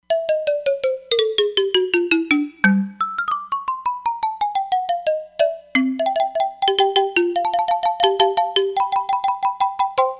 FS Xylo, Roland Fantom XR

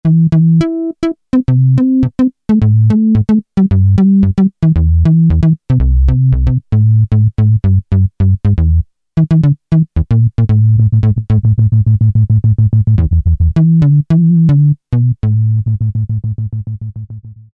Pulse Bass; Alesis Rap Techno Dance

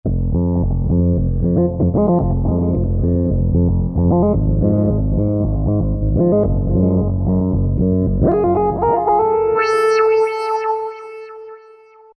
P5 Lead, Roland V-Synth; Factory Patches

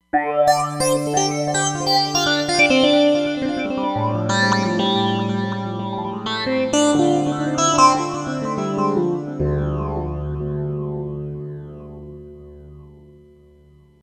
Roland Fantom XR; X-Racer

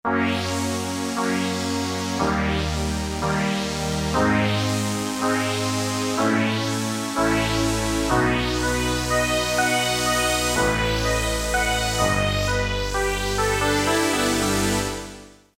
Garage Pad; Roland M-DC1

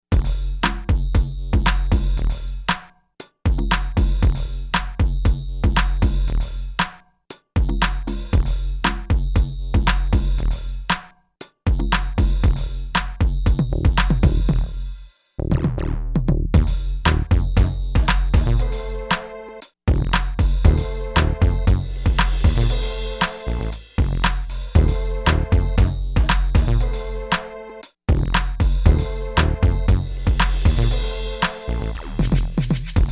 AKAI XR20 Factory patterns; ZILLA

